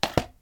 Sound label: put DVD case